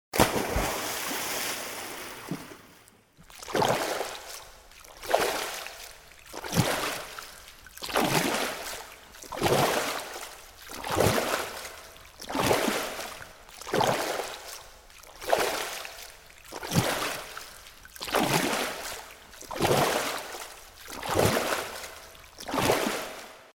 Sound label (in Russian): nyriaet i plyvet